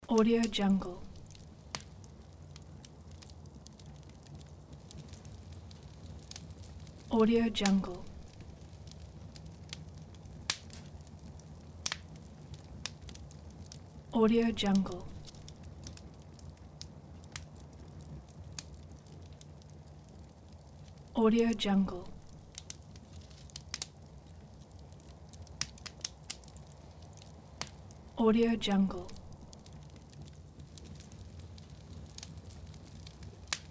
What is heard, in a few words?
fire burning crackling